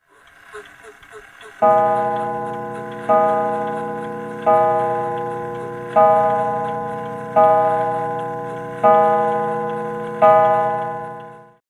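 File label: American Mantelpiece, clock chimes rings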